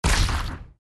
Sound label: Ink Explode